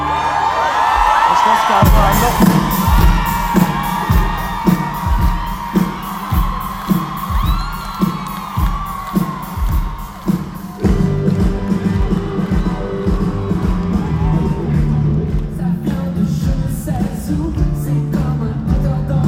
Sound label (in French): Cris et applaudissements; intro